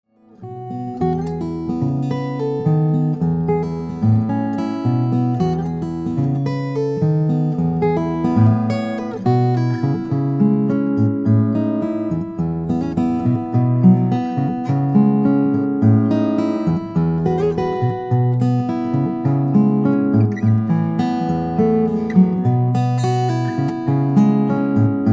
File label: Acoustic Music